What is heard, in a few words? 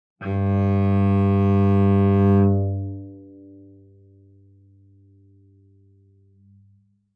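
Cello arco fortissimo sul G G2; MIS Pitches - 2014; Strings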